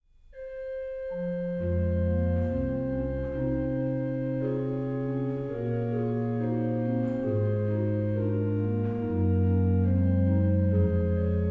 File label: Bach Organ Works; BWV 621; Da Jesus an dem Kreuze stund; Orgelbchlein